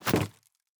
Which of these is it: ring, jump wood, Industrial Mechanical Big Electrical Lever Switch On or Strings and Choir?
jump wood